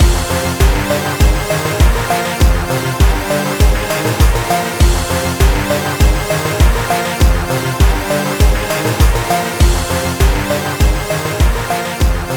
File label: Burning-Hot Beats